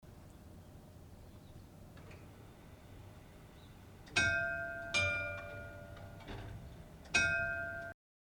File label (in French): cloche; Beauvais